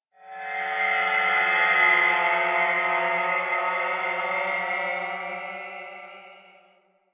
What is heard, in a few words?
Railjack exterior space whistles; Ambience; Warframe